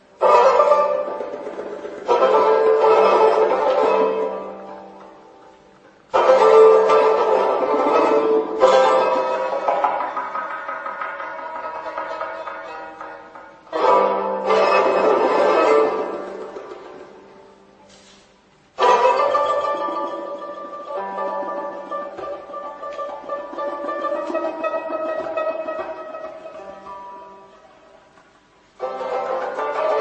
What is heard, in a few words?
saghar